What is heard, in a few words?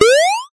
multimedia game bounce jump